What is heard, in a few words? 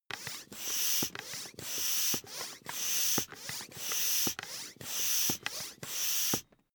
air pump ball sequence fast